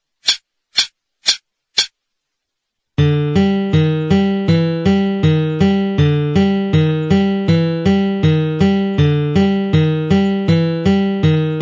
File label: alternate picking, guitar